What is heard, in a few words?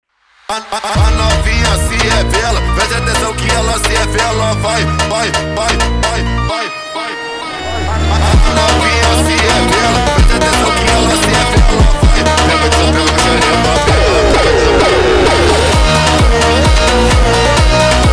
sped Up, funk